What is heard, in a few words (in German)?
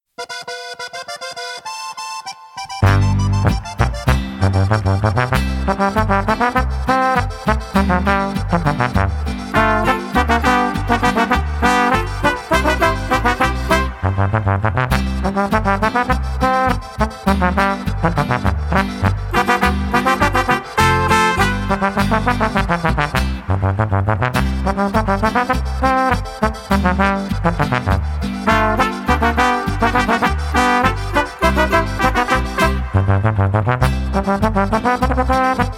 Boarischer